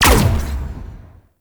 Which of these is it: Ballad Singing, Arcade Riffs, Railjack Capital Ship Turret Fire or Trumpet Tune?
Railjack Capital Ship Turret Fire